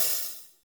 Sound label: hat pop hh, Hi-Hats; Roland L-CDX-01; HH Modules